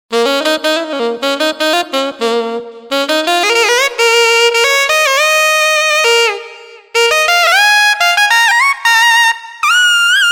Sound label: NY Tenor